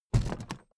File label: suitcase drop